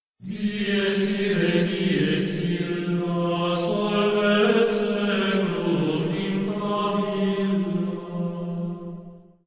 dies irae chant

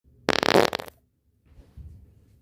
firework fart

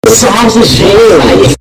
bass; salsa jeans

